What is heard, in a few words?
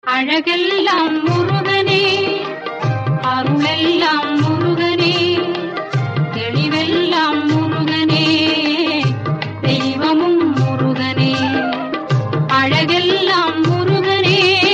TAMIL DEVOTIONAL RINGTONE